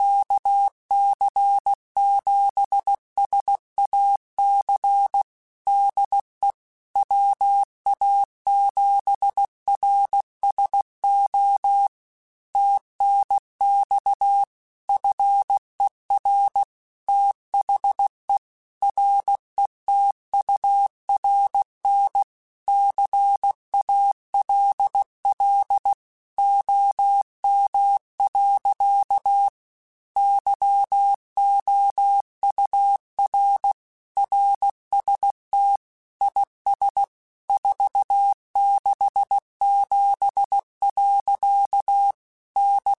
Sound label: Morse Code, QSOs